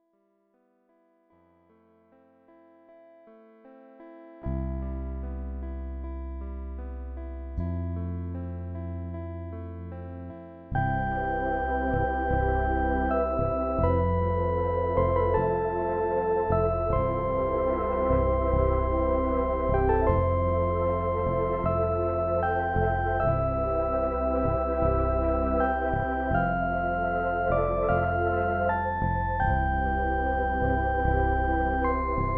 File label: A short ambient piece that provides an aire of relaxation; Relaxing by the Pond